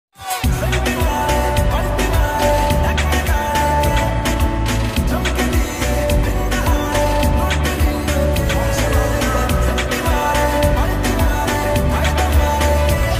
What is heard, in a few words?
lofi bengali ringtone